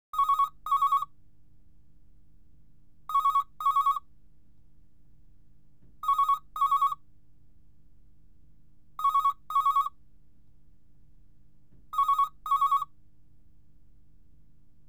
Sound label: BT Domestic Telephones, States; Computers Printers Phones; BBC Sound Effects Library